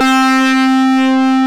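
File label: distortion guitar C3, GUITAR; AKAI S-Series CD-ROM Sound Library VOL-8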